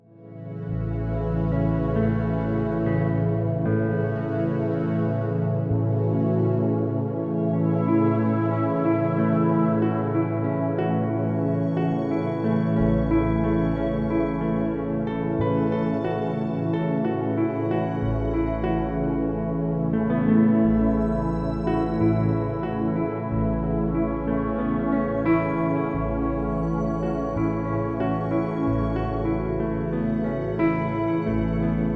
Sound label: Peaceful Keys